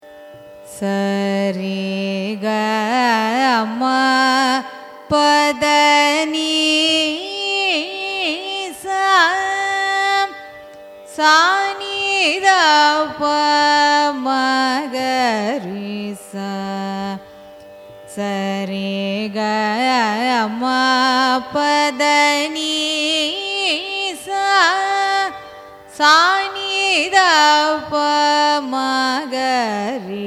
raga thodi arohanam avarohanam; carnatic music

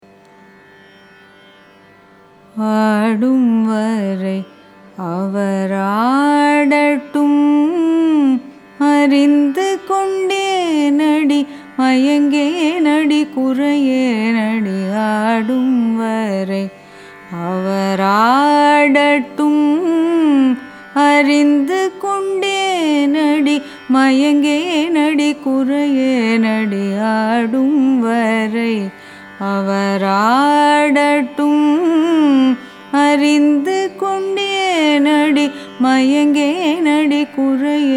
raga huseni carnatic

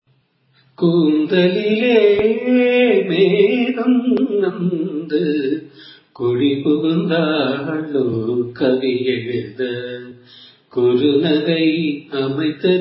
raga bilahari cine; carnatic music

